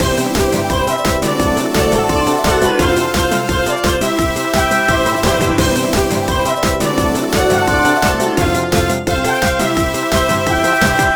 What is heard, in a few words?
bonus guild dragon day